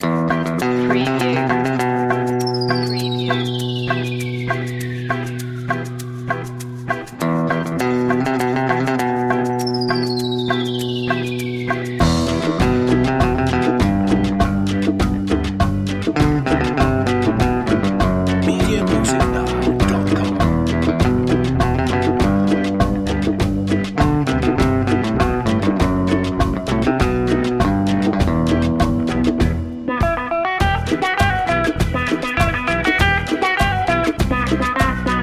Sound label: pure snow latin quality